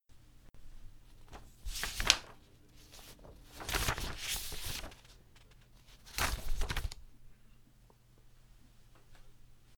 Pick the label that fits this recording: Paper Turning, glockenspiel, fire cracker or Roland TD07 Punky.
Paper Turning